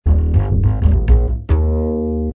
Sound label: Upright Cookin Eb; Lounge & Reggae; Loopology; Be Bop Baby